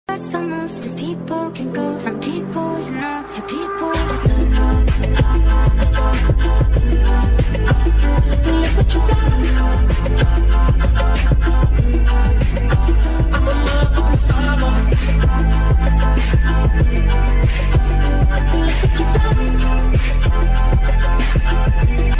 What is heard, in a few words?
song ringtone